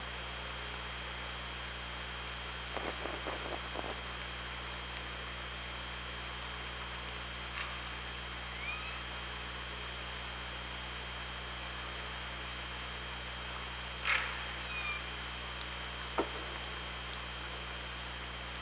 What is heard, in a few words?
OrcaLab